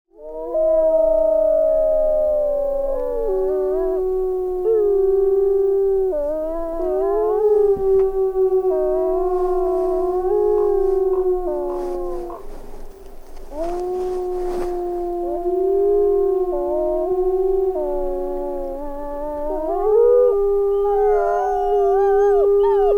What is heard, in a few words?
Lamar Wolves Howling